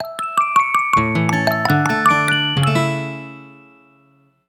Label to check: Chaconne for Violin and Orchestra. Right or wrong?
Wrong